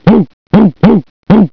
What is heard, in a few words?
BARK; Monster Media Number 15